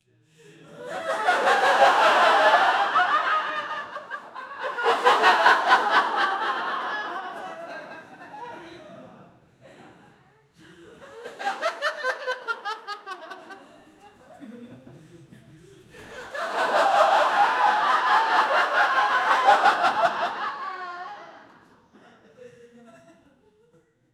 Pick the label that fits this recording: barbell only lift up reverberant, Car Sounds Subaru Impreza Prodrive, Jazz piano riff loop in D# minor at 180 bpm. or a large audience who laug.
a large audience who laug